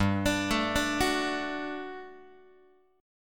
G Major 7th Suspended 2nd G down Guitar; GM7sus2 arpeggiated; x,3,5,7,6,5

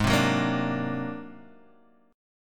Ab 9th Flat 5th Ab down Guitar DADGAD

